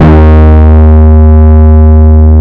BASS, D#2; Zero-G; Chemical Beats; BASSES

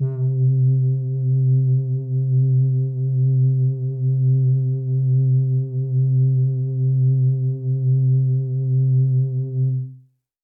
Pad C, Synth Prophet One Shots; Electronisounds

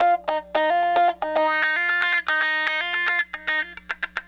Raw Funk Guitar

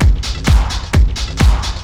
techno, DRUMLOOPS

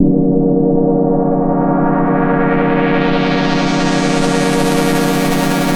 Chaos Pad; Sonic Foundry (Sony Creative Software)

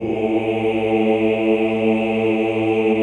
CHO A1, Ooh; Ooh + Ooh 2

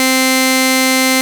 Pro 1 SAW C5, Vintage Keyboards; Big Fish Audio; First Call

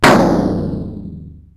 SDS9 RM, Simmons SDS-9, Rimshots

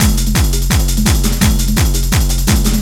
LOOP, Eurotech; Sounds Good

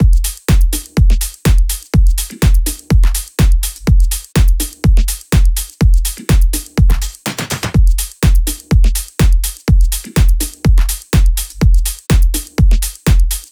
loop full 124; Seismic Loops

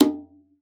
Conga, Session Instruments

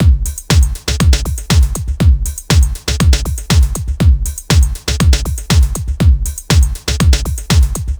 4 floor beat, trance

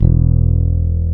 BASSES, Roland

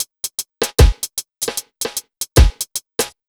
BT, Ragga & Dub Reggae; USB Soundscan vol.27; AKAI

